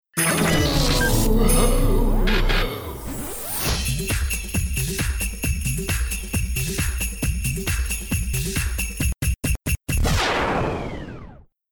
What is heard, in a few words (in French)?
Base rythmé